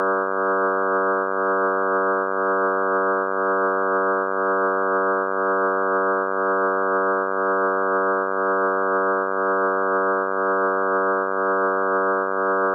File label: Mary had a little lamb